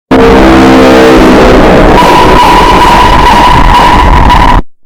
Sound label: sus bass boosted